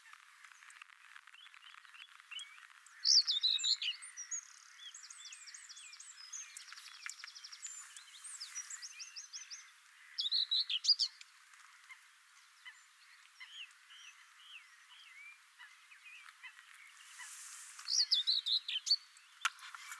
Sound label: whitethroat summer leys